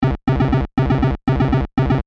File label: Video Game Synth, Technology, Video, Game, Synth, Bass, Rhythm, Gallup, Loop; BlastWave